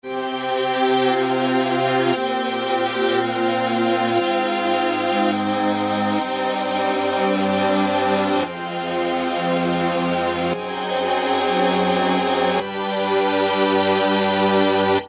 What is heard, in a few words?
Tron Strings